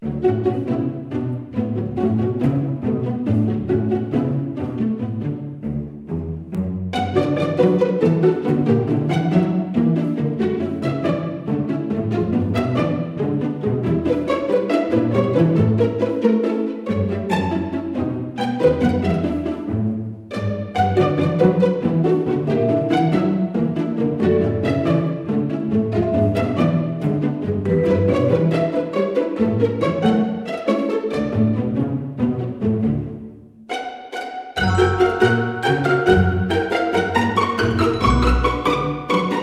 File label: 139bpm